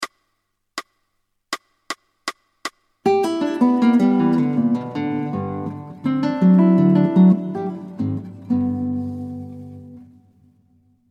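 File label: Fingerstyle Ringtone, Guitar; Gitaarboek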